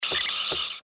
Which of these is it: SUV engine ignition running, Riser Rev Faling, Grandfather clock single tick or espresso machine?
espresso machine